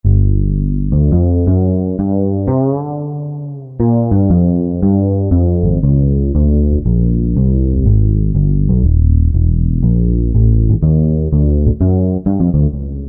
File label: Fretless, Korg M1; Factory preset examples